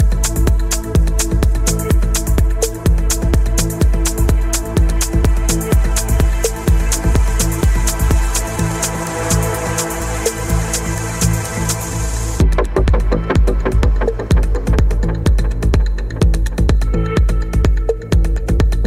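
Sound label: latest trance ringtone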